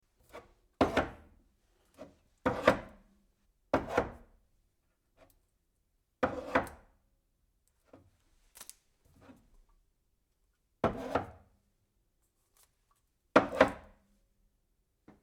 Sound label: cans into cabinet